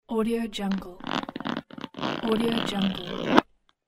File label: zipper